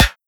metal prop, bullet impacts; Half Life Model Pack

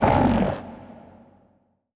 strider pass by; creatures; seq passby

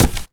cardboard box impact hard

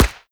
dirt, bullet impacts; physics; Half Life Model Pack

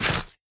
step light, footsteps, player, mud

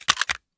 pickup ammo

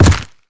step heavy, zombie blind

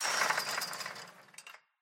ambient chain link rattle, metal

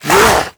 attack grunt, zombie; creatures

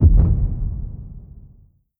step lfe, strider; creatures; Half Life Model Pack

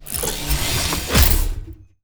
combine switch box open stop, mechanical units

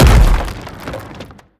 bash hard, brickwall, destruction

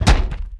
dog footstep run; Half Life 2; Episode 1

